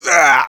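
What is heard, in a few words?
pain sharp, French; Sniper; Douleur aiguë 4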